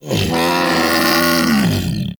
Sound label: Vocal Death; Hunting Critters; Predasite